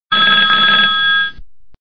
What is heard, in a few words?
Old Phone Sms